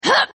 hit; Power Rangers vs Teenage Mutant Ninja Turtles - Ultimate Hero Clash 2; power april

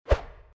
sword; Keep Out! A Dungeon Adventure for the Brave

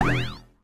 enemy fire; Adventure Time - Angry Betty; ThrillGames

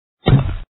hit; Ball Physic Game

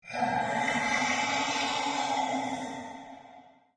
ghoul; Be Cool Scooby-Doo! - It's Dark Out There; ThrillGames

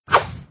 slide; Cartoon Network - Toon Cup 2020

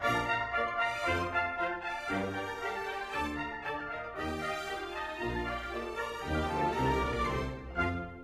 music results score screen; run-dorothy

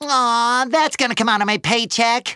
lose; spongebob normal

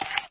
carrot crunch; Bunnicula - Juicy Bites

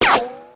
bomb; Fighter Aircraft

